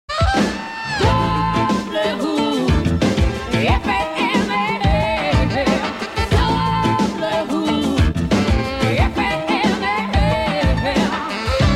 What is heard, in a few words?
Jingle, radio